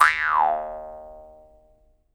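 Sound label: Jaw Harps, Pitched Percussion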